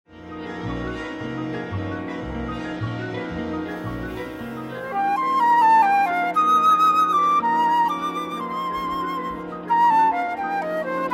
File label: Concerto for flute